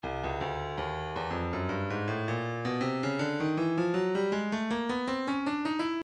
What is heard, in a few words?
percussion midi files shakers 080 shaker; Stylus